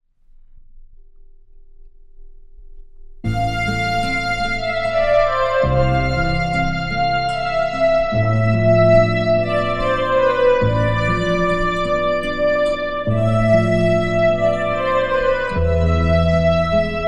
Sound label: strings and guitar